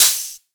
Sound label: Open Hihat, Techno Drum Shots; Ghosthack; Free Techno Drum Shots 2024